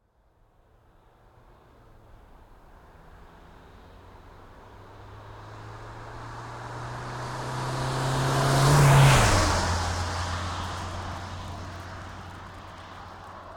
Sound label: Renault Master F3500 dCi135 Exterior Passby High RPM High Speed; Renault Master III dCi135; StereoM10; SoundHolder